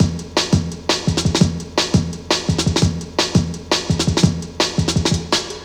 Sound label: Sweet Beat, Drum & Bass; Fluid Dynamics